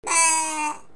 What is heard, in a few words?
baa